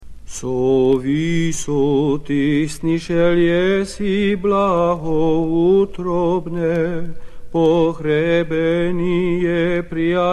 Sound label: Tone 8 Tropar; PappIrmologion